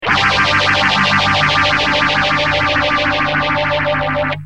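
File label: guitar boss ph1r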